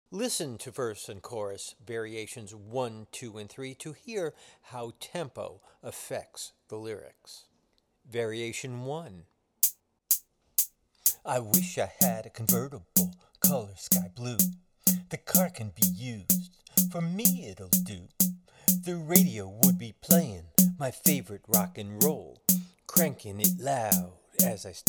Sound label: Verse Chorus